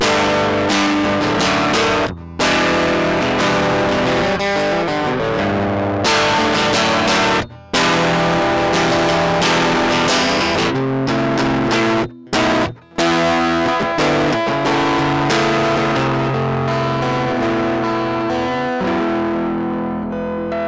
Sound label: strumming; tl distraptor; tonelib